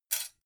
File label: dough hook drop deep; industrie-wirtschaft; gastronomie